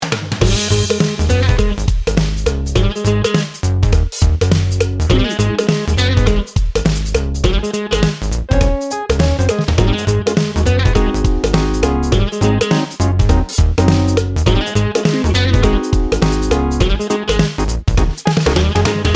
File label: funk in da house